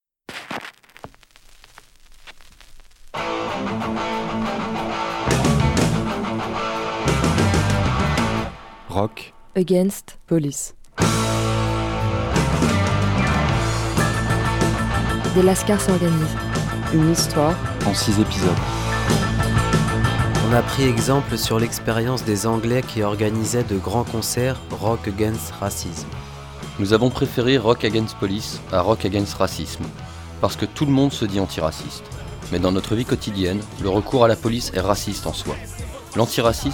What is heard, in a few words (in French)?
jingle RAP